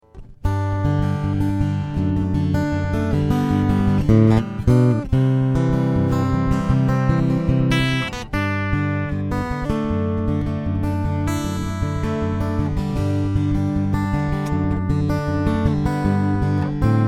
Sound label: Finger Picking